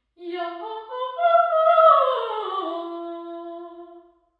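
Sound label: council chamber impulse response sing, York Guildhall council chamber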